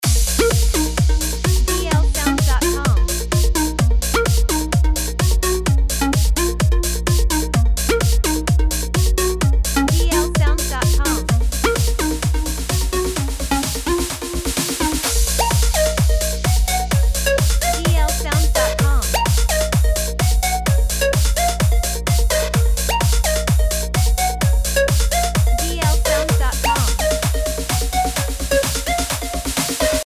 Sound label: Happy Dance Loop